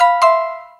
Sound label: Rewarding Chime